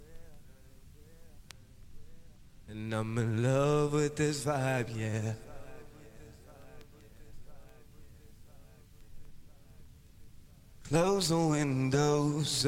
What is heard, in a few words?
acapella